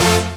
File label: chord A; Techno Trance Essentials; CHORDS